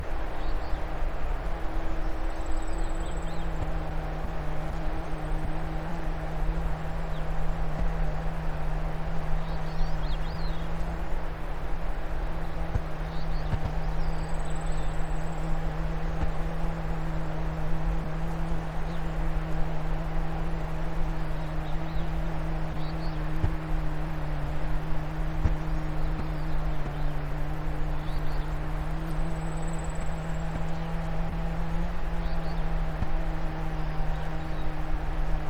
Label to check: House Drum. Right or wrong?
Wrong